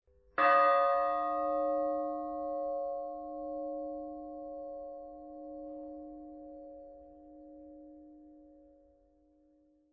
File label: russian bells; zvons